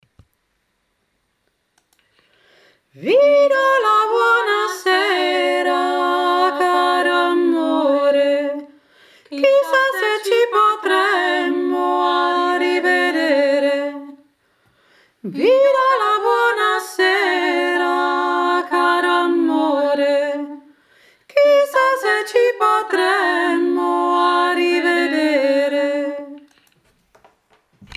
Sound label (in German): vi do 2 stimmen